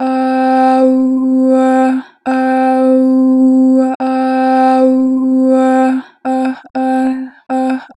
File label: Adlib, Harmor Vocals; 4 bar flat; Pitch Flat